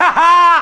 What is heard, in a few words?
ha ha; Voice; ooh ahh